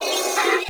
Sentient Symbilyst Pain; Warframe

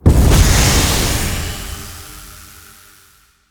Survival Capsule Activate; Warframe; Missions; Zariman

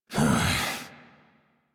Key Grab Exertion; Warframe; Arthur

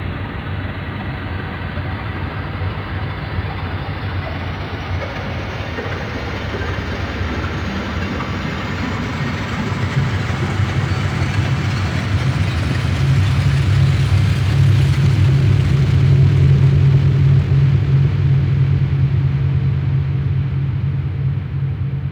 Bed Loop, Interior; Warframe; Shadowgrapher

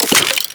Bubblegun Pistol Reload

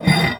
Cast Iron Slide; Gameplay; Awakening Remaster

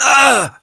Generic Short Pain, Operator Voice; Operator Mission

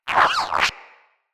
Mine Osprey Spotted; Characters; Corpus

